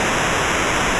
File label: noise